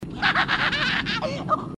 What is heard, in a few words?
grunt victory laugh